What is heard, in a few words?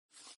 slide, backgammon